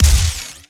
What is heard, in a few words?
Sci Fi Shotgun Fire Heavy; GUNTech; AlientAttack.MonoGame